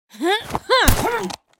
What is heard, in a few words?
Trample Fail; Goddess of Trampling